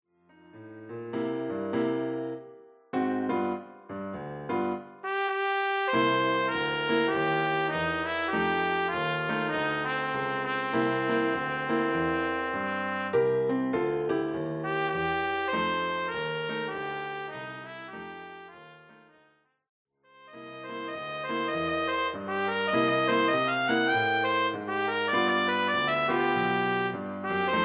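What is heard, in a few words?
trumpet; solo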